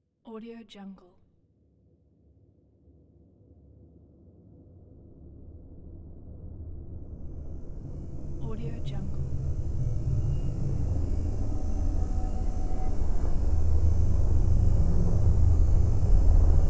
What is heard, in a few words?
drone ambience